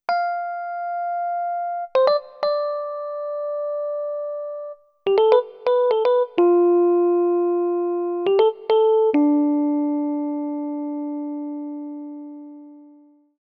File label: E Piano